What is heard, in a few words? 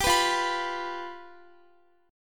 F Suspended 2nd Flat 5th, Guitar; Fsus2b5; strummed